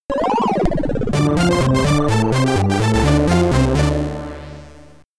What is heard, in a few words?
Death (Game Over) (SID Stereo); creatures; commodore 64; Clyde's